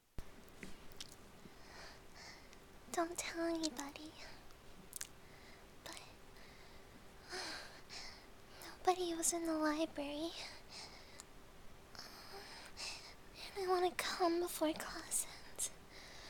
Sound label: Hehe